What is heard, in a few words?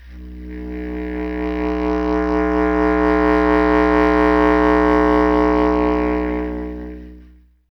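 wind bass clarinet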